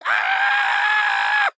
Stripe Charge

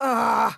AHurt; Garnet

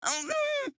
Hurt

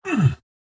AHurt

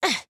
AHurt; Harley

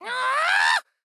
Charge; Gizmo

